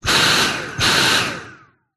household vacuum cleaner stick short suction burst close to end of open; dyson v7; hose